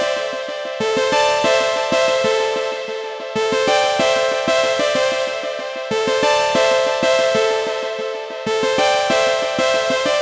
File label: synth dagger; Polyend; Loops n trouble